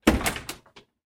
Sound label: door close; Golden Crayon Sounds